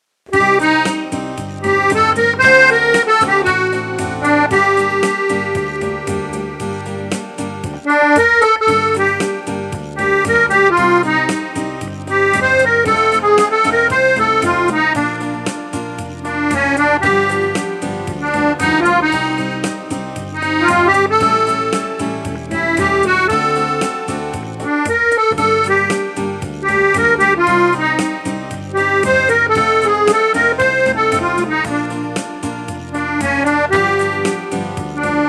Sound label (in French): Amour melody